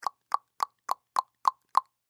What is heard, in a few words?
Human Tongue Clicks; Mouth (Sound Effects:Human)